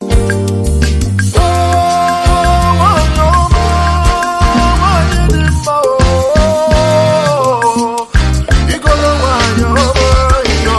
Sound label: Wedding song